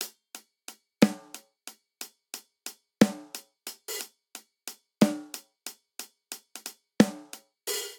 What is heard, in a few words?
Swing Six RnB Snare & Hihat 6/8 90 bpm; Ableton; Factory Packs; Drum Booth